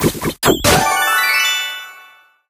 Boss gift-Open; Candies 'n Curses; Android, iOS

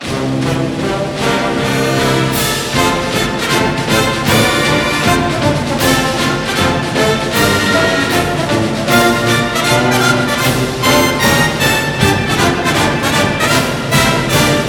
Broadcast Fight Song; NCAA Basketball 09; PS2, PS3, Xbox 360

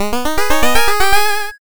Book Collect Jingle; curse crackers for whom the belle toils switch windows gamerip 2022

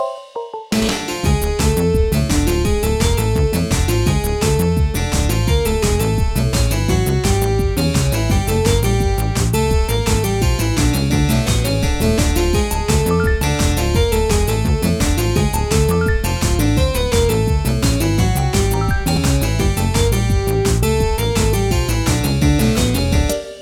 Mus Coop Generic Combat Med

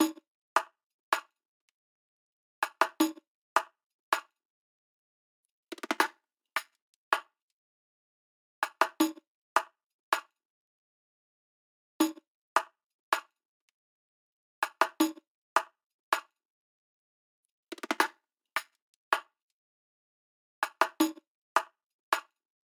Riq Tamb; raji an ancient epic

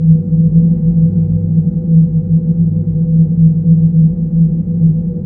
Amb Windroom Loop

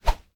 Tool Swap

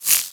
Fx Dragonfly Wings

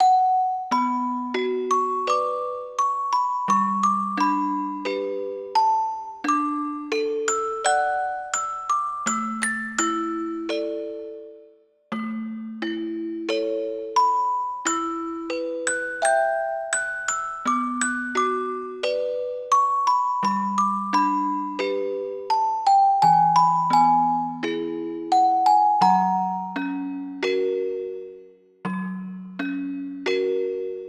Luna Sanctus Theme music box

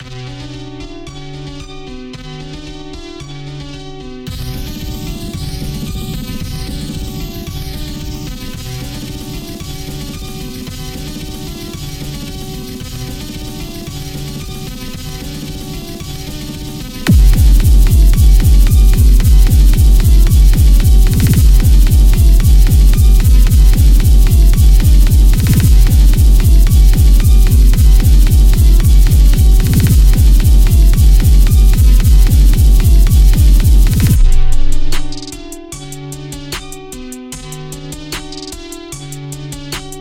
Blocked (Instrumental); friday night funkin vs. dave and bambi gbc

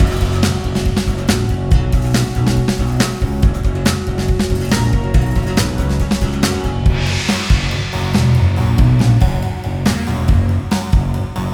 Mini Boss Celebration Loop; Cryptheim

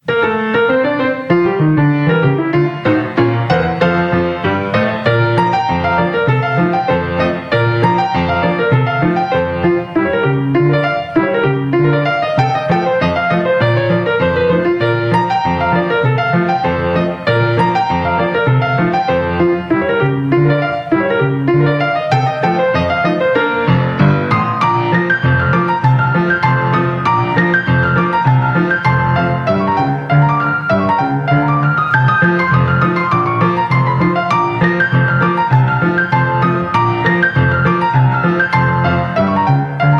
Piano Pub; Sherlock Holmes The Devil's Daughter

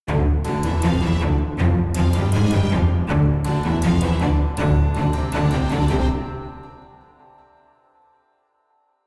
Combat In; The Witcher 3 Wild Hunt; Novigrad

